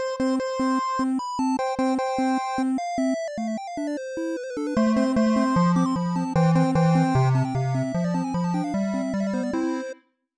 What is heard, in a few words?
Game Music